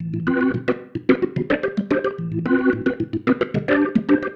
Pattern, Organ

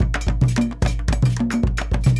Mai, full ensemble, world percussion; Sony CS - World Percussion

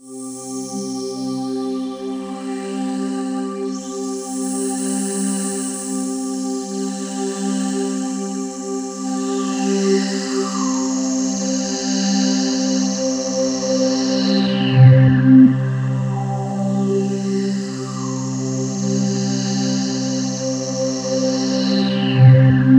POLY SAW, Roland JV 2080, C3

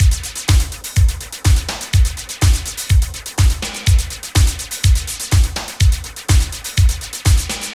LOOP, Tekno Industrial; East West

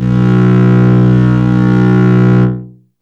Synth Bass 3 B; Sonic Foundry (Sony Creative Software); Ugly Remnants VOL-2; Basstuff

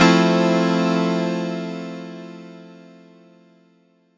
Fmaj7, Chords; Electronisounds

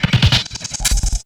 SFX, Drum & Bass; Ueberschall; Drum & Bass Resonance

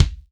KICK, MG KICK

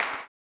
CLAP, Blues Soul; Spectrasonics Vocal Planet

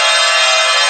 NON-PITCH C2, Prophet Five; E-MU Formula 4000 Series Vol. 3; Analog Odyssey

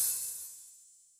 H Opn, Hi-Hat, Yamaha DTXpress

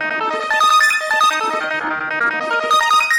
synth arp; Electrocution

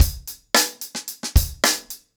110BPM, acoustic drums; The Roots Drumloops

